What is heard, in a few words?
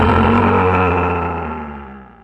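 hardrock mammoth die; hardrock mommoth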